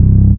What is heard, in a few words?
Bass C, Offbeat Bass; vengeance essential club sounds-3